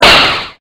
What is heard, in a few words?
BLAST